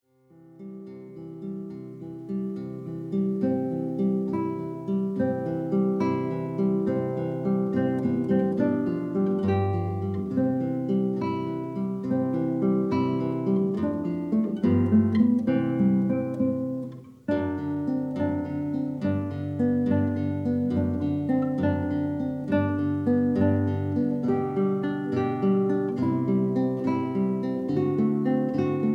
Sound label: Allegretto, Spanish Guitar Duo; Flamenco